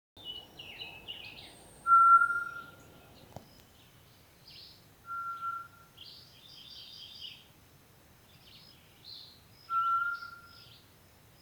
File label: thicket tinamou, birds